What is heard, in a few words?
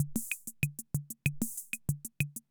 Ace Tone Rhythm Ace Loop, Drum Machines; 1967